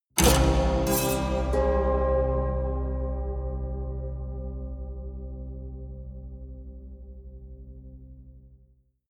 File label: haunted strings dark omen heavy